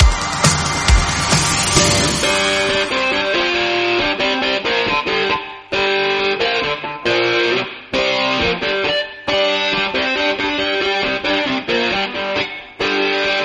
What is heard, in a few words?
136bpm Gm